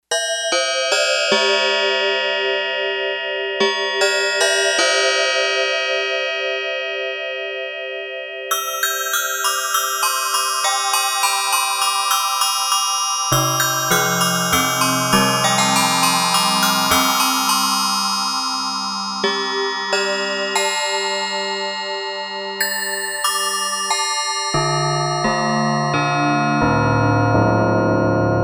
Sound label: Tub Bells, Yamaha TX802; Factory Patches; Voices